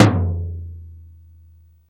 high tom drum sound e key; tom drum samples

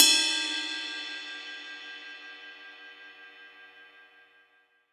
ride cymbal e key

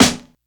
reverb steel snare drum b key

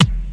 old school dry kick drum sound f key; kick drum samples

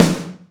rich lows snare drum sound e key

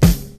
natural acoustic snare sample f key; snare drum samples

